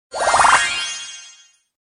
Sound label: level up